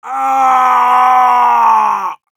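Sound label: pain critical death, ru; Heavy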